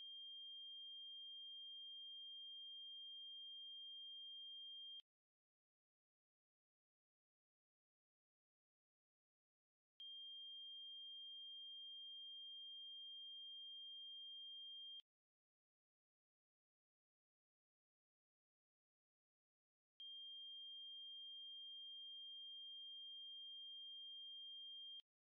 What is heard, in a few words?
phones